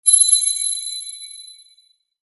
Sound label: crystal bell